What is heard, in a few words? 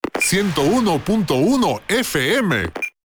Radio Latino Jingle; Gangstar West Coast Hustle; Android